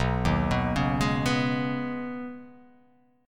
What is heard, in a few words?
B 6th Add 9th B down, chord